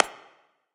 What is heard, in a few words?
clap; smite; god thanatos